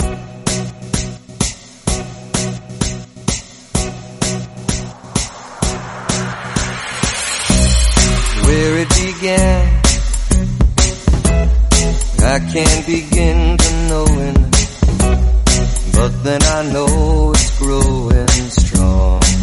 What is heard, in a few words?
REDRUM 128bpm Abm